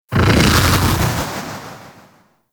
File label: Infested Splitter Flip Whoosh; Warframe; Grey Strain